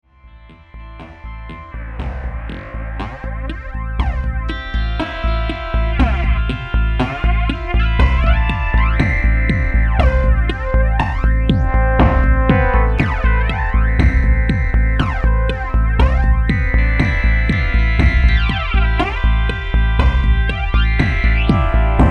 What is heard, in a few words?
Delay Parameter Tweaking; Mega Synthesis